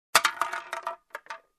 Soda can kick

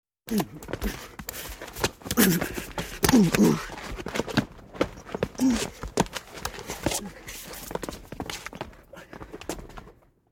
Boxing sparring sounds

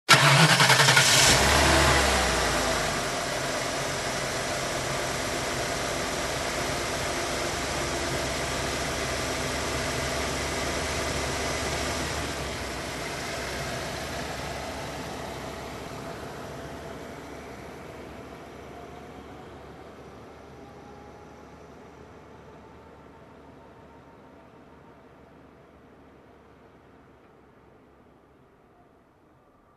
Pickup Truck engine ignition

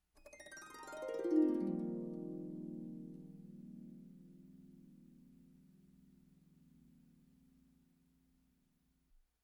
Smooth harp down glissando dreaming sound

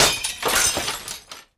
Window Shatter

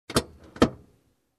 Car Door Handle

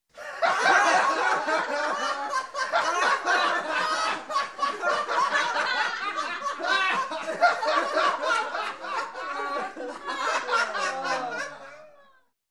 Gathered People Hysterically Laughing

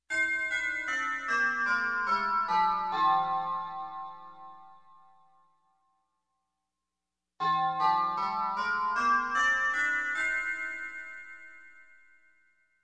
Bells scale down and up

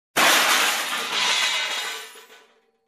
Smashed Debris Sound

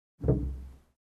Dull Noise outside car

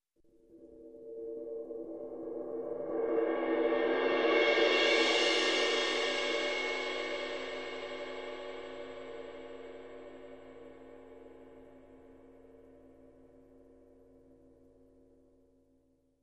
Long Cymbal Swell

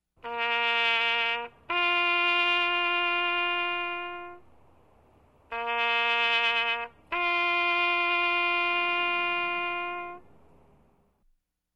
Trumpet Taps

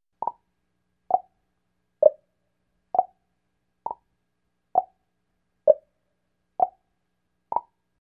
Fast and short woodblock hits

